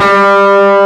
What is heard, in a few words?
G2, GUITAR; AKAI S-Series CD-ROM Sound Library VOL-8